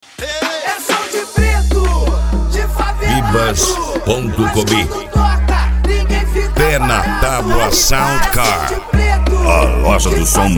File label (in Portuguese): sound car carnaval